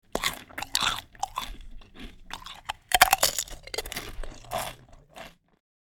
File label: Eating potato chips mouth closed crunchy